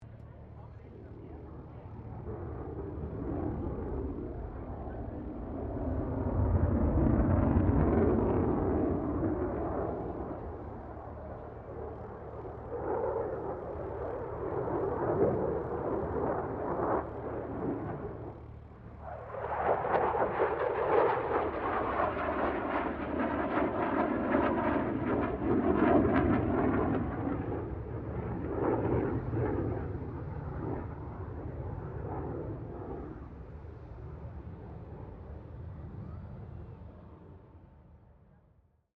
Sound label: F16 Falcon pass distant, stereo; Airplanes (Sound Effects:Vehicles)